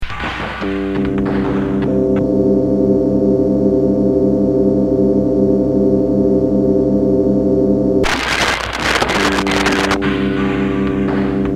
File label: demon saddest chord